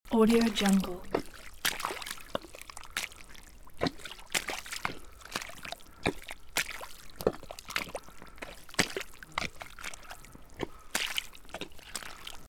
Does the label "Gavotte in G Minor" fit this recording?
No